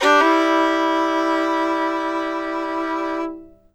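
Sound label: fiddle hammer C4; Sampleheads; Whole Lotta Country 1 & 2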